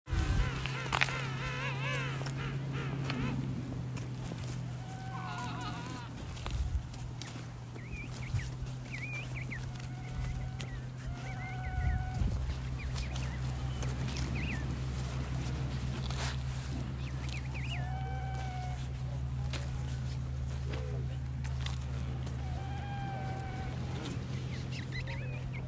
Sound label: Salisbury plain South Georgia afternoon landing